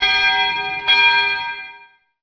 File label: harbor; BESTSHOT; noisybit